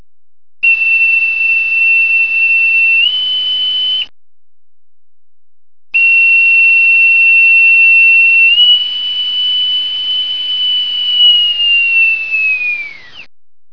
Bosn Pipe

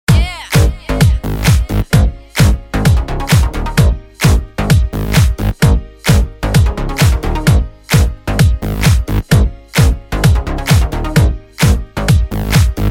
Music

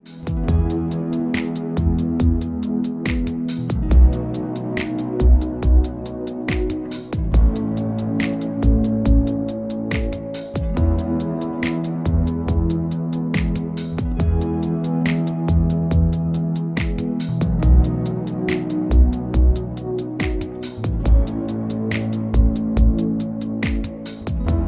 Pop Ringtone

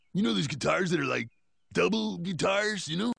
Double Guitars

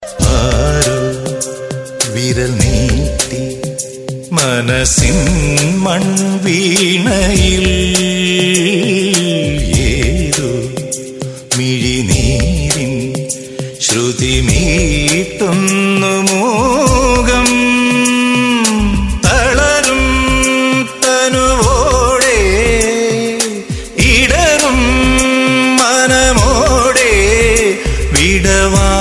Malayalam Romantic

